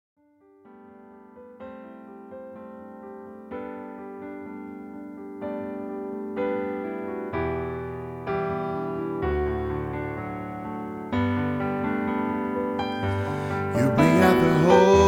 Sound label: Holy Bas